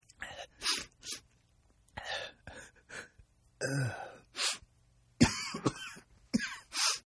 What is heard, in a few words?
Sniffly Cold Male; Sickness Sounds; Sniffling; Human